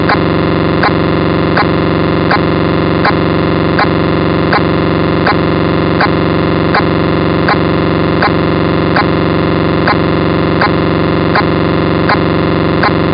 ermes europe nfm; 169413khz

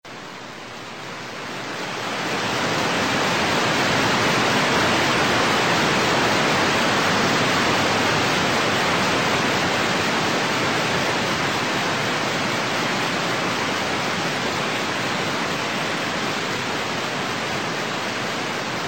solar burst

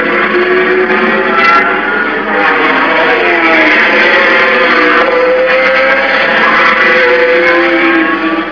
indy car race

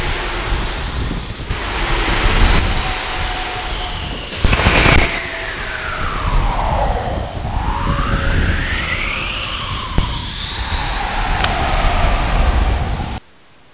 geomagnet saucer

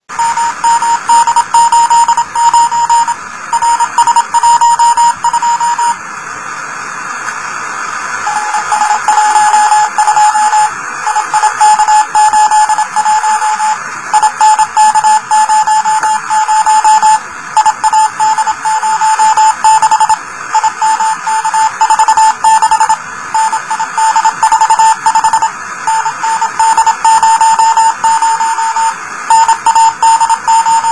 telemetry CW; rs12

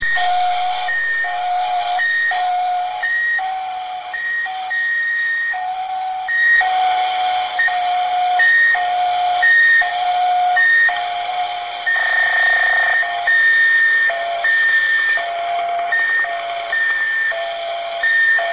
fsk pdm; cosmos 114

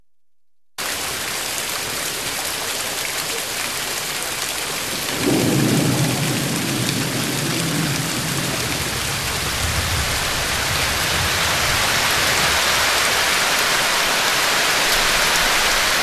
rain thunder

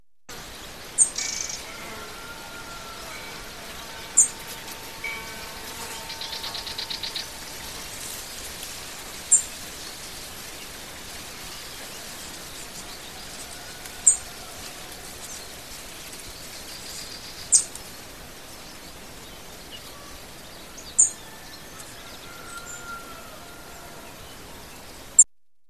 wind bell flies birds

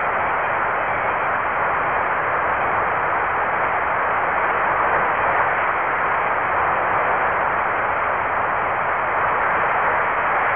jupiter L burst io b storm stereo

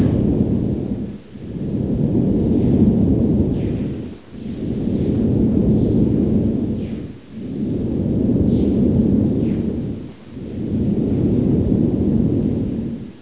geomagnet hiss

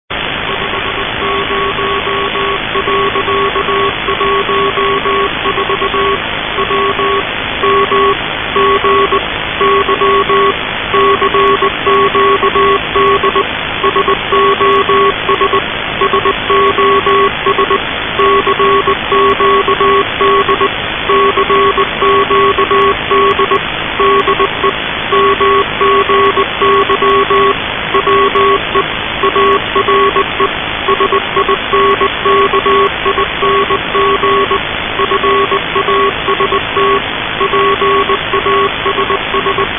titanic 21wpm, Radio

